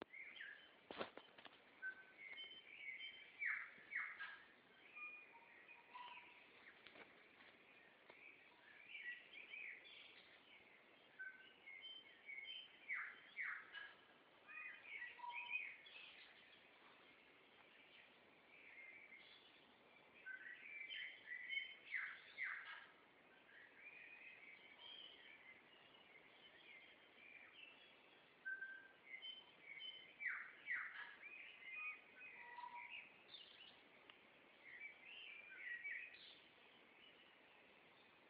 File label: early morning bird call in my backyard